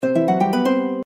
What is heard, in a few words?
win; Four Beauties